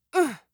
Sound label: ouch boy; spiritheroes